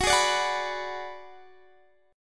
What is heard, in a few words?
Ab Minor 7th Flat 5th Gb